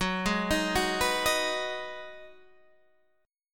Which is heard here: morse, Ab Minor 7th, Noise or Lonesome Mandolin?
Ab Minor 7th